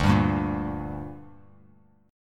D Suspended 4th Sharp 5th D down Bass Standard, chord; strummed